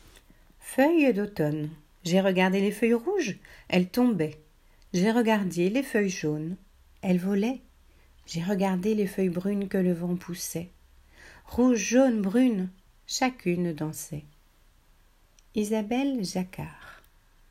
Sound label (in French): Feuille d’automne